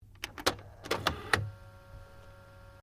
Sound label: Stereo Akai Mini Tape Deck Rewind, Multi function music player; Tape Players; Electronics